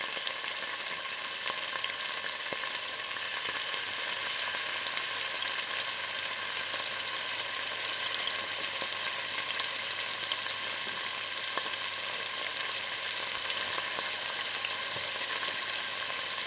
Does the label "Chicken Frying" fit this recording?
Yes